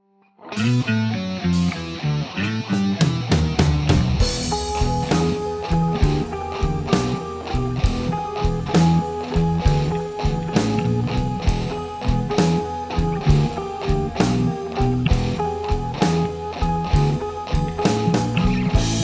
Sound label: blues